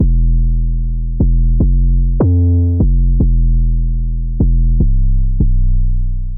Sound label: Hip Hop Rap